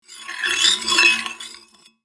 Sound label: Tea spoon light clinking; kitchen